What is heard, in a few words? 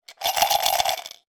money coins in plastic tub shake